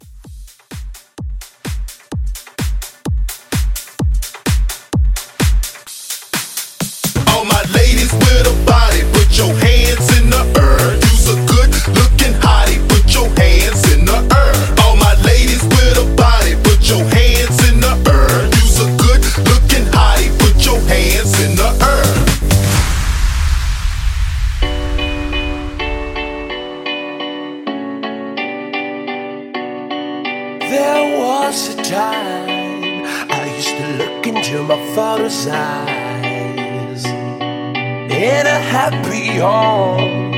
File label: Club Hype